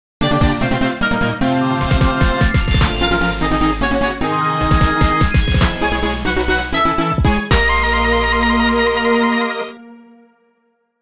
Oboe Obtained; Cadence of Hyrule

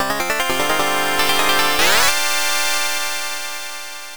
Level Up; Tengai Makyou Ziria; PC Engine CD-ROM2

